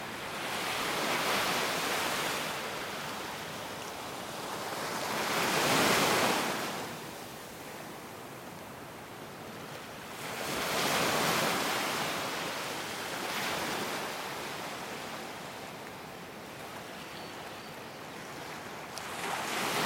soothing monotonous gentle sound of waves with gentle distant singing of birds